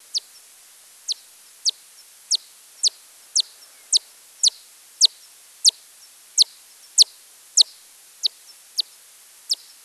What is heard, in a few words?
Myotis daubentonii